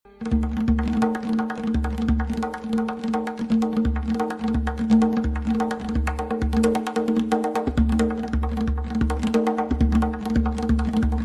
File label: do mezrabeh santoor